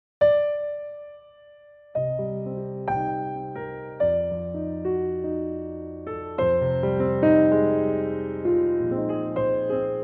Eb piano; key - Eb; Pianotrak; Beautiful piano only arrangement

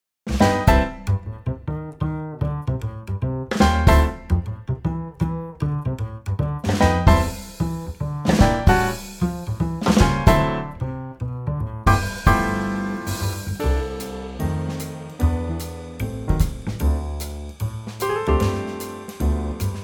C Trio; key - C - vocal range - D to F; Trio arrangement of this classic from the American Songbook

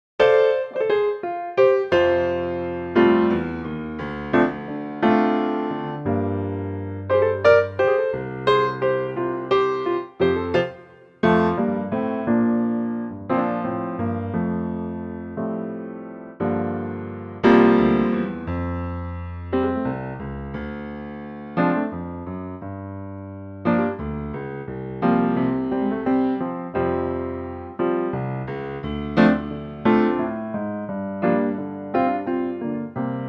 Piano; Pianotrak; key - F - vocal range - D to F

